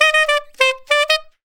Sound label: 098 W-Coast HipHop Sax Eb